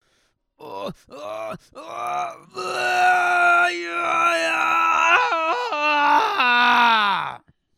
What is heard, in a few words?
Ayaaaah Sortie Stereo